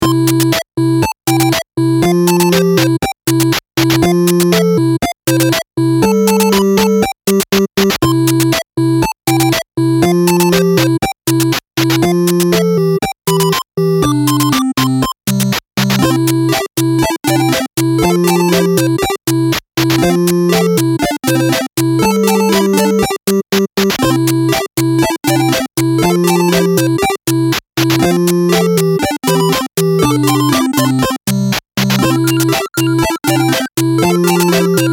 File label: Happynes - Royalty Free 8-Bit Game Music; Caveheart Legacy of the Depths